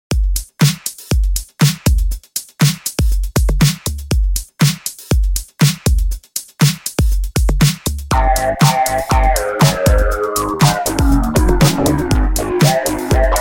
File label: Korg Prophecy Dark synthpop; Darksizer